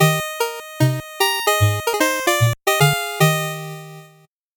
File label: 8bit jingle normal